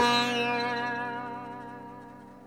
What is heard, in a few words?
sitar, Guitar; Notes